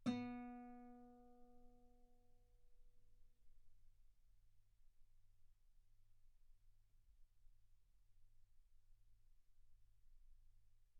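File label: Guitar ff B string B3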